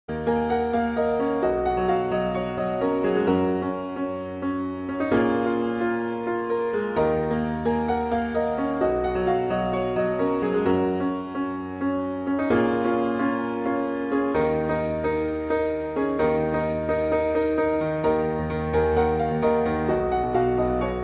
Piano

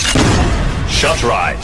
Shot Rise

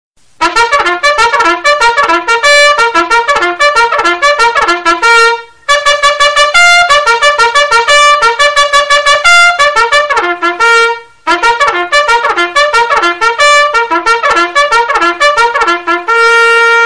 Trumpet Wake Up Loud

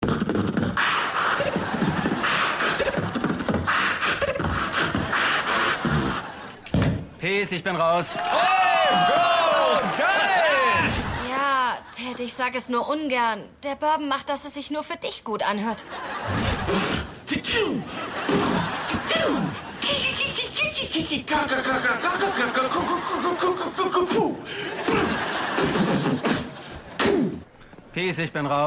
Beatbox German

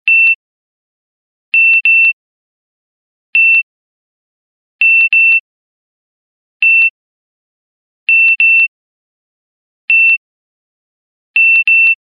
Bleep bleep Bleep; meloboom

